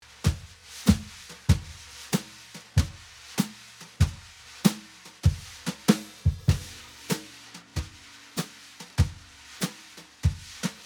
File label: Xmas Drums